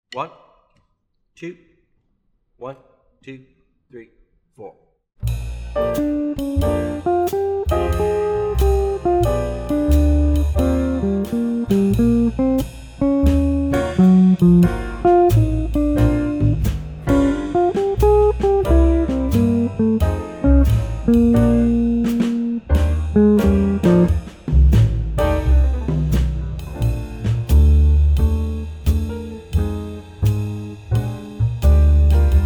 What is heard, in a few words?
swing guitar and vibes